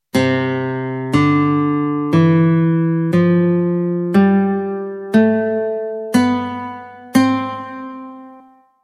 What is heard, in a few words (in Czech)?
Stup. C dur